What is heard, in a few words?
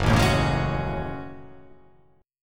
F sharp Minor 6th Add 9th